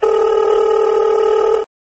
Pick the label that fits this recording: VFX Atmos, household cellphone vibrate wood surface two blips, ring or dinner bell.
ring